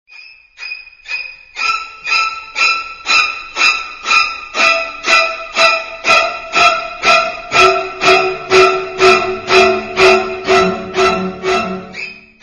funny psyco ringtone

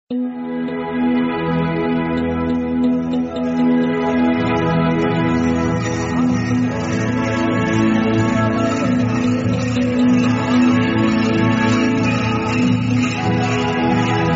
samsung desert ringtone

